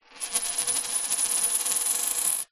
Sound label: foley money coin spin on glass; zapsplat